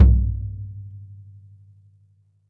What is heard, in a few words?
kick - snares off; JazzFunkKit